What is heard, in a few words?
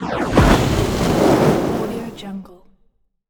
trailer fireball impact whoosh